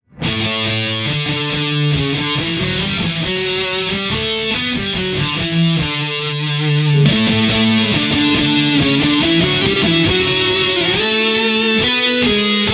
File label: BED Riff; 12 Days of RoXmas